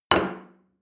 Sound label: plate place table hard short; sport-freizeit; restaurant-cafe